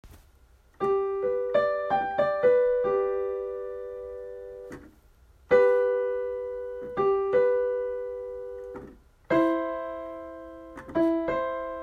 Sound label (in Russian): Интервалы соль мажор